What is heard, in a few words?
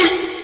oohh; chinese man